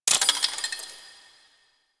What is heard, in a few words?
Vitruvian Museum Donate; Warframe